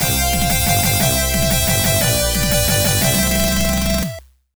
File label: Game Clear; Saint Sword Genesis